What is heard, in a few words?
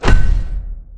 hammer rumble